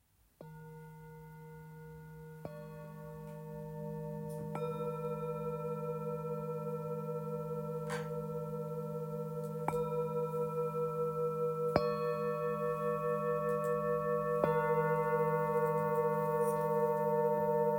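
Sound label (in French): Set, bols chantants tibétains; brillance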